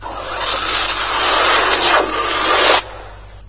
portal; minetest; teleport-request

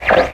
ham radio tuning; minetest

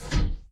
portalgun door; minetest

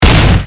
rangedweapons shotgun shot; minetest

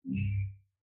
televator error

